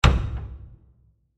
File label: Wood Impact; Miscellaneous Impact; Impacts